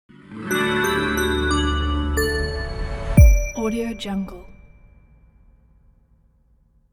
clean logo

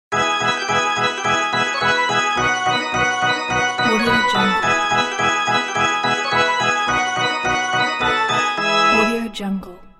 sports and circus organ

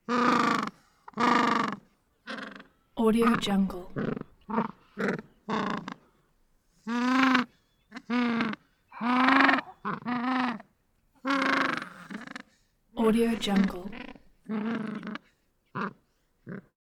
penguin gentoo purring squawking sighing

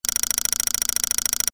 ui scroll small; mechanism source; soundmorph

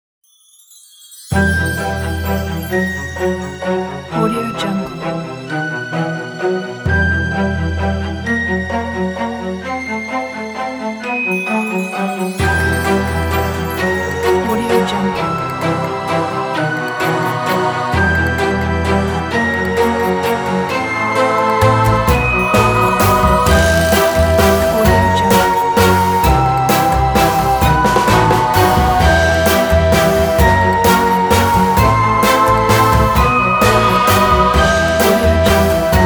halloween fairytale

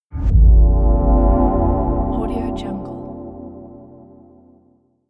short animation abstract tech logo

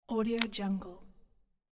click button

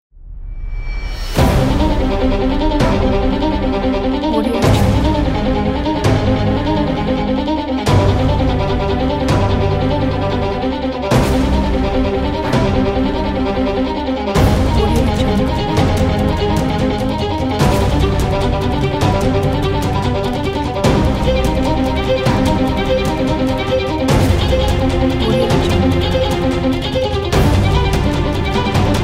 this is violin; commercialmusic

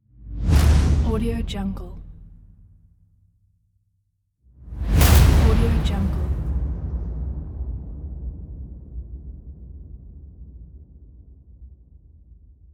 cinematic swoosh

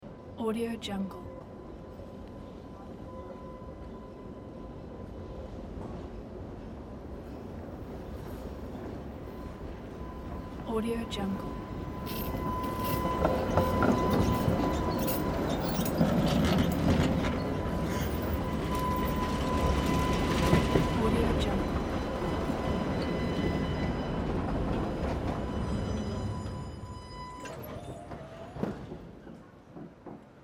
tram arrival

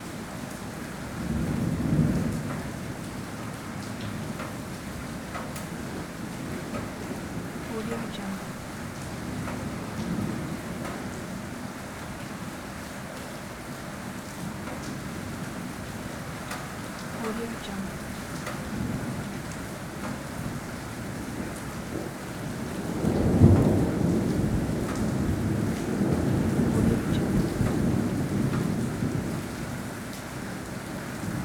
heavy storm in old open italian courtyard

